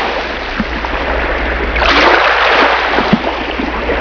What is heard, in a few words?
Water, 水声; 特酷声音素材库